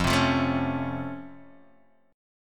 Db Augmented Major 7th, F

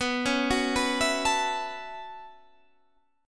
B 7th Suspended 2nd Suspended 4th, chord

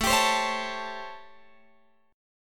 A 7th Sharp 9th, Guitar, Open F; A7#9; strummed

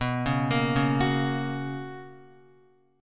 B Major 7th Suspended 2nd Guitar, chord; BM7sus2; arpeggiated; JGuitar

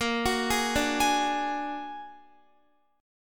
Bb Augmented 7th Bb down Guitar 4E,4A,5D,5G,5C,6F; Bb+7; arpeggiated

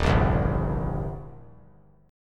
Eb 6th Eb down; Eb6 strummed; JGuitar

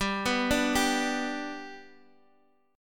G Major G down, chord; G arpeggiated; JGuitar

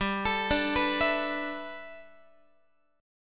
G 6th Add 9th G, Guitar; arpeggiated; x,15,12,12,15,15; 4A,4E,5A,5D,5Gsharp,6Csharp

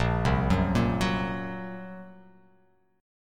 Bb Suspended 2nd Sharp 5th Bb down; Bbsus2#5; arpeggiated; JGuitar

